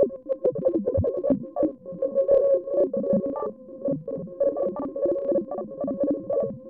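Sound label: babble, analog synth; AKAI S-Series CD-ROM Sound Library VOL-6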